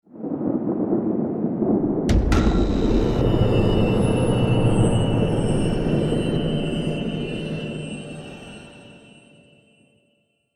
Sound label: Stinger Decrease Action